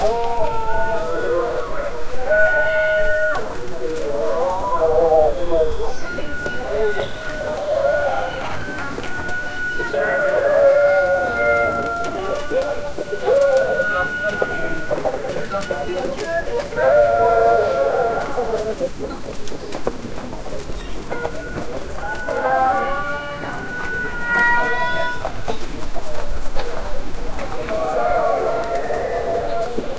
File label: an ancient busy middle ea; middle eastern